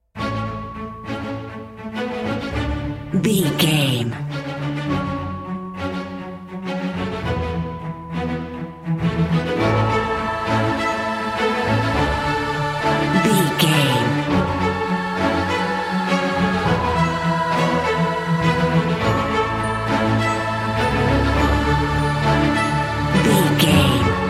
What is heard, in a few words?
cinematic corporate no drums